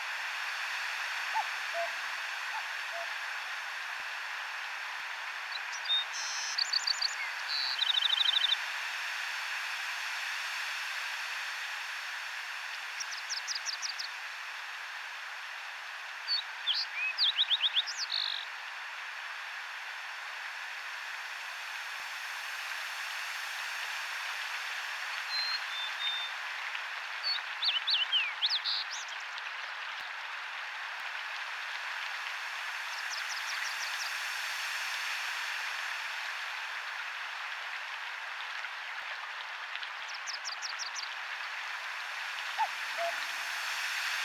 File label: Prairie Sounds Soundscape